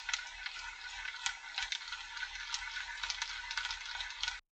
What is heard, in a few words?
pulling; Fishing2